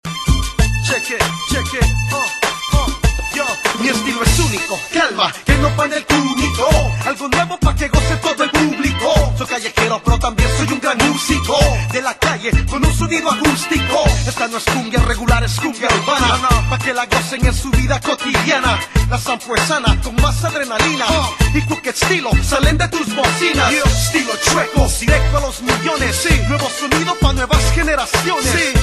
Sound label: cumbia ringtone